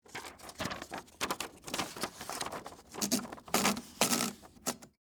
Typewriter Load Paper, Technology, Typewriter, Load, Paper; Typing; Offices; BlastWave